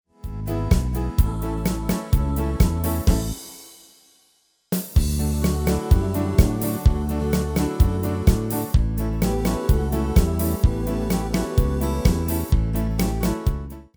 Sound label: MIDI